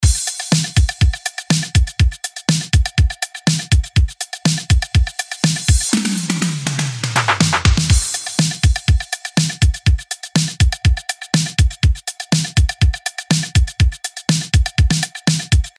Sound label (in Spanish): Bateria ejemplo